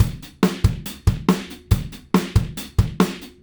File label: Drums Rock Verse; Alex Sanfilippo